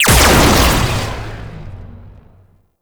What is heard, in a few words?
Shot; Warframe; Corpus; Tenet Quanta